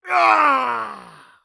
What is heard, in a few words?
death; Escape from Tarkov